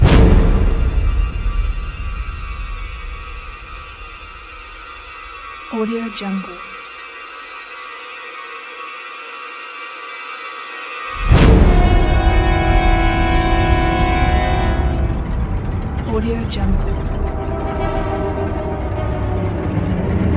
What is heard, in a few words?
dark orchestral suspense cinematic hits